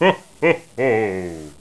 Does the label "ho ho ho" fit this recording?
Yes